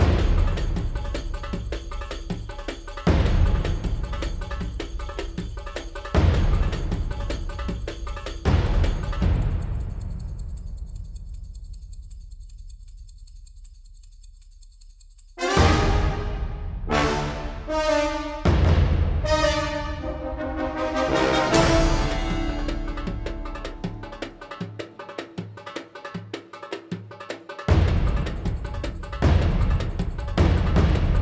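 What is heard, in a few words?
Percussion loop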